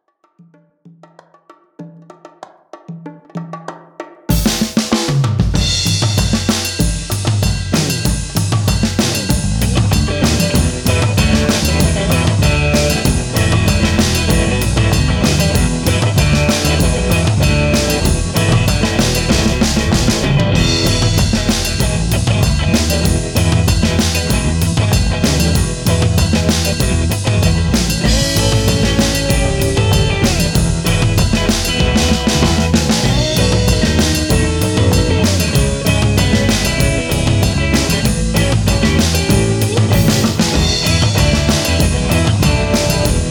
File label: karaoke